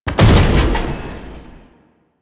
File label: ram ability hit; Warframe; Lasrian; Lasrian Tank; Abilities